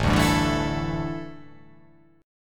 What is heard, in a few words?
A 7th Suspended 4th, A, down